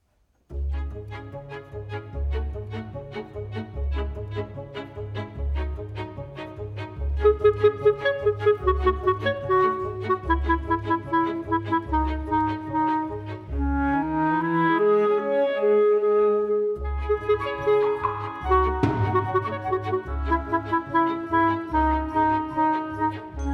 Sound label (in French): clarinette; petit prince; businessman